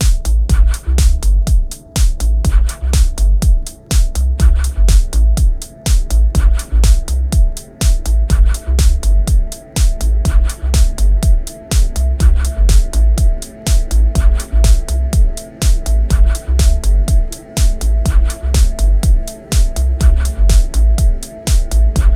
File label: vocal dub